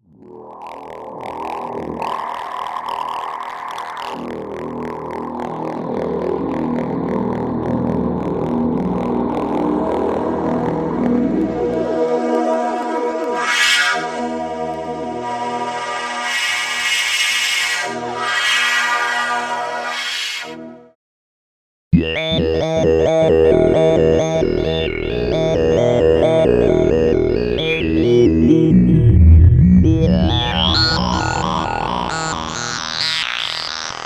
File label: Blofeld